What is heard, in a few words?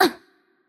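Korean hurt light; ko KR; VO; bloom minigame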